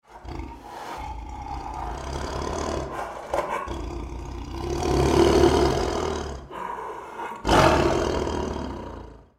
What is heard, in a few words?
tiger sumatran growl stereo